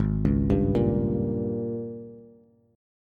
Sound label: Ab Major 7th Suspended 2nd Suspended 4th Ab, down, Bass; arpeggiated; JGuitar